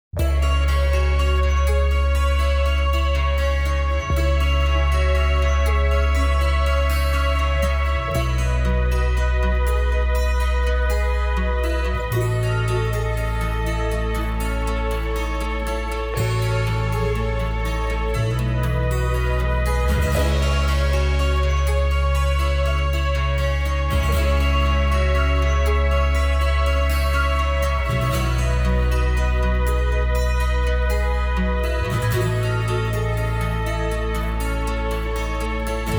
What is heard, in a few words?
wounded instrumental